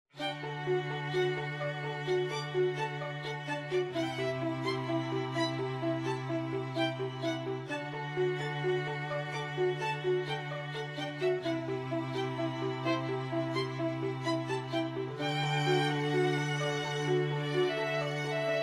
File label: STRING ORCHESTRA